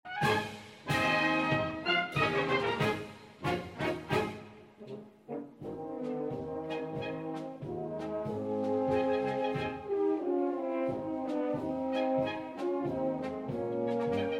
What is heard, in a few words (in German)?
Trio